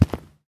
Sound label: hard footstep; Too Many Stones